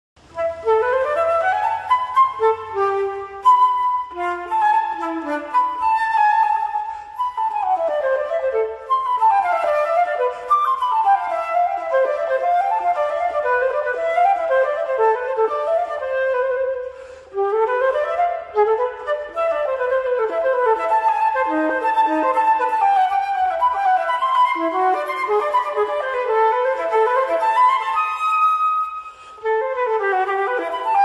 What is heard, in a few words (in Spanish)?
FLAUTA TRAVESERA SONIDO